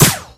crit hit mini; video games; source engine; tf

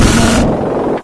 alien laser; ambience

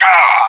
whack smash; npc; source engine; left 4 dead 2; moustachio

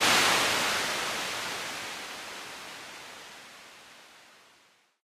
static fade; ui

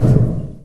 metal grate impact soft

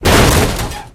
vehicle impact heavy; Source Engine; video game; vehicles

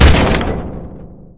bumper car hit; tf

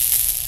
superphys small zap; Source Engine; video game; weapons; physcannon

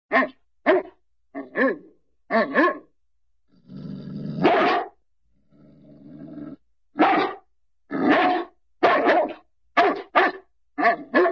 dog st. bernard barks and growls, animal; cartoon

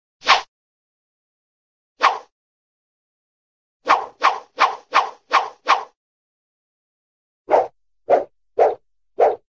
swish, cartoon single rope swish

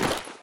taunt yeti standee equipment jingle; video games; source engine; tf

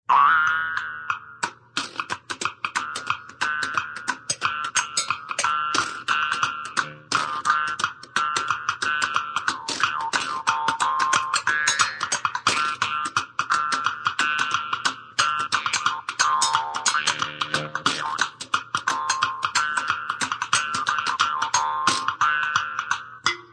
music, percussion, mechanical man, cartoon

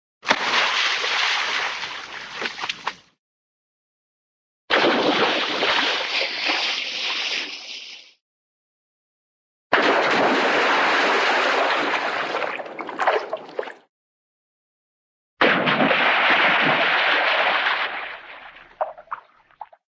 water, splash medium splash, cartoon

